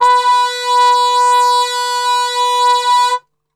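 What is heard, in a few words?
B 2 trumpet cup mute; Best Service; Brass Super Section; TRP CUP MUTE; 90 s Sample CDs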